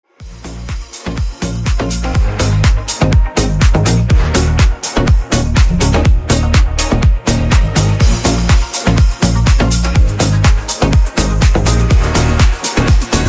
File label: Melody Instrumental